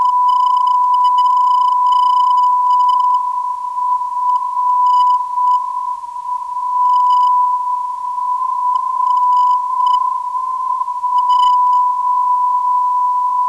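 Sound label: non-directional beacon; FTF; 335.06kHz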